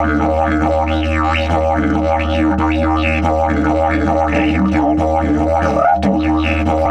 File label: EF 140, DIDGE RIFFS; Big Fish Audio - Didgeridoo and Other Primitive Instruments